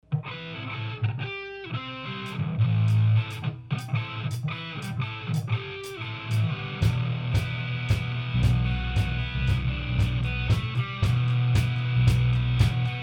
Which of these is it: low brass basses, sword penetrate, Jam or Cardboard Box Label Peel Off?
Jam